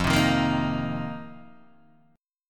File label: F 7th Suspended 4th F, Guitar, DADGAD